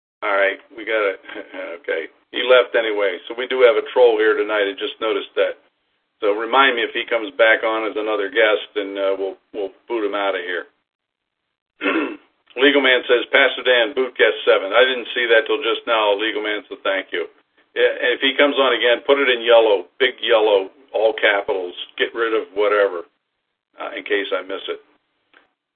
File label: censor